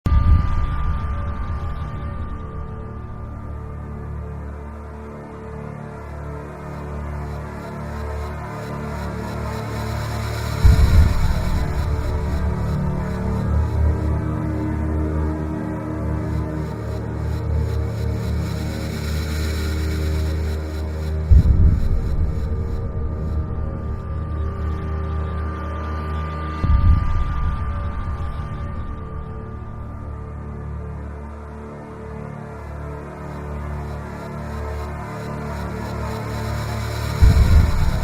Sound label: suspense; saw